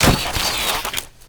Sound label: Military Backpack Destroy; Objects; Storage Container; Warframe